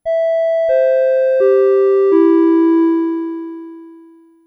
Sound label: Chime; Bosch; Chimes and Announcements